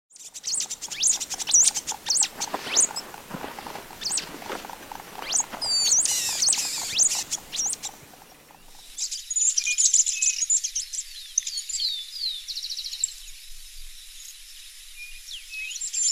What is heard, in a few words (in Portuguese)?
Canto do capacetinho